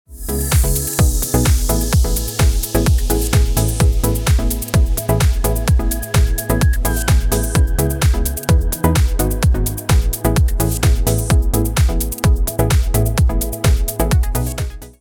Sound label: satu high